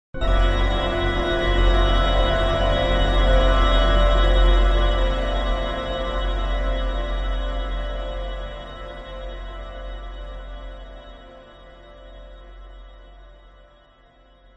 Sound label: horror stab organ like dramatic scare evil